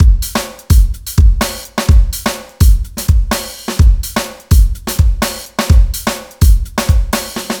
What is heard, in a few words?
FUNKEY, LOOPS; Masterbits; Dance Trax; SILLY CONE 1